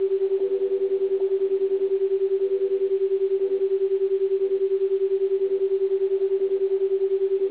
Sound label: WWV unlocked